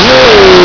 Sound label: buzz start move; buzz mod; Emperor - Battle for Dune